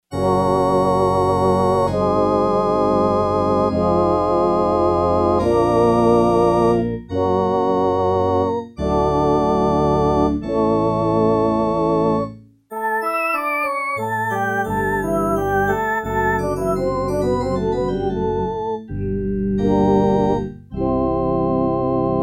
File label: Organ Choir, Yamaha TX802; Performances; Factory Patches